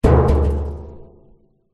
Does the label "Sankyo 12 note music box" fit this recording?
No